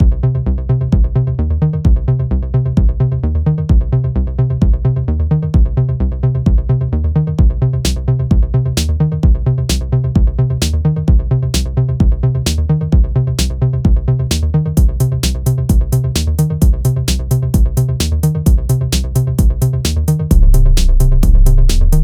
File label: short song